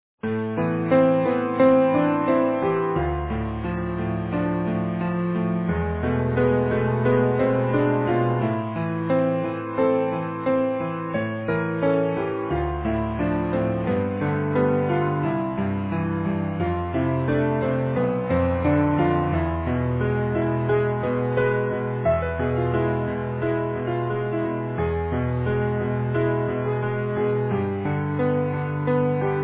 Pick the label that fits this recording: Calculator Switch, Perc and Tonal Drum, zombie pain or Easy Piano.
Easy Piano